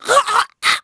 DEATH, VOICE; Pulse Racer

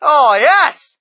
crowd, shout; Mike Tyson Heavyweight Boxing

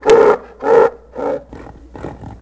snorts; tusken; Star Wars Obi-Wan; XBOX

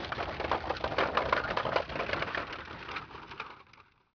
vehicle, town; Pirates Of The Caribbean; AMBIENT

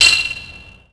grind metal pole on; terrains; Tony Hawk's Project 8; XBOX

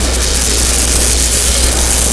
grind wire spark, terrains; Tony Hawk's Project 8; XBOX

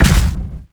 L Carn step, Dino

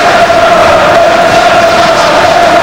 Chant, Crowd; David Beckham Soccer; XBOX

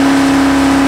Mustang67 rpm, Engine; Cars; XBOX

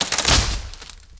tree snap; impacts explos

